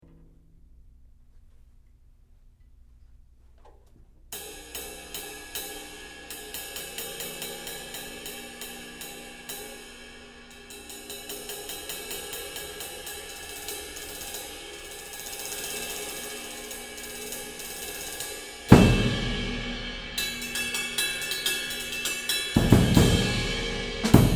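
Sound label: Pre Split Black Label Rock Ride, Istanbul; 2316 grams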